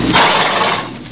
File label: snort